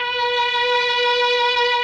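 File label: MELLOSTR, Mellotron Violins; Sonic Reality; Vintage Timetraveler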